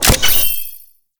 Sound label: Owl Recon Aim Connect Start; Warframe; Duviri